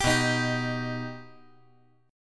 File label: B Augmented B, chord